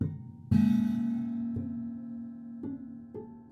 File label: Prepared Piano